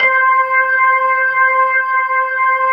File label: C5, Keyboards Old School; USB Soundscan vol.09; AKAI